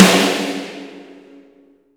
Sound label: NCSN2RRFMH, drums; Best Service; Real Mega Drums VOL-1